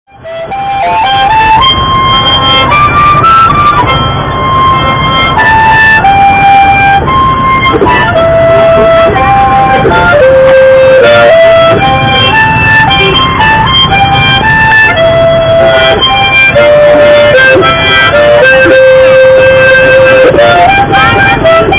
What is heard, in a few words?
harmonica; nanning; shanghai